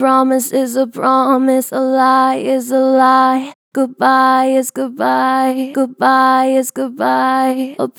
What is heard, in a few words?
Vib Promise is a promise, Vocals, Natural Vibrato Strong; Harmor Vocals